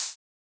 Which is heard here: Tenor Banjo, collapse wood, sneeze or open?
open